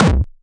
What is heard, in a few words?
nasty stormfront; Nasty Stormfront Electro FX